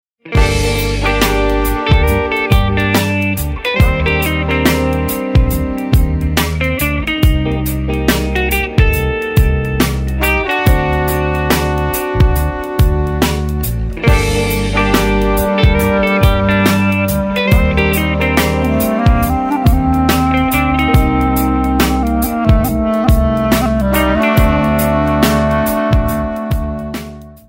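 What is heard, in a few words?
rain melody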